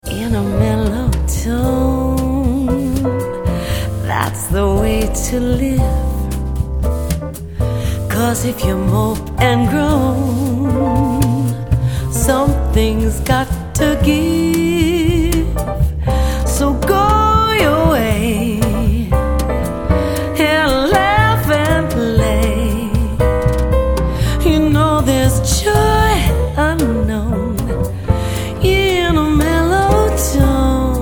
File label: In a melow tone